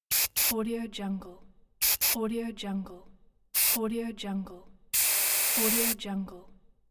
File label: hair spray